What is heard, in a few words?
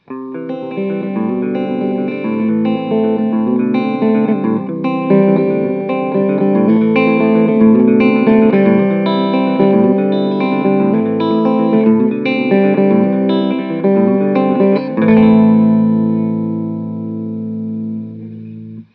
Clean